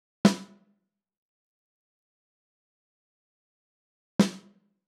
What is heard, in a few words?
Sn ambi